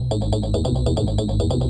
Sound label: TECHNO SYNTH 140; Keys